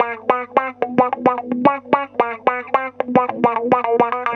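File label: guitar, Roots Funk; USB Soundscan vol.41; AKAI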